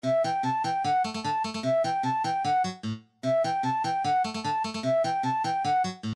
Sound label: gameboy camera song, midi; game system gameboy